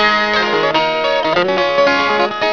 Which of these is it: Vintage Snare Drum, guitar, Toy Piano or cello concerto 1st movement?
guitar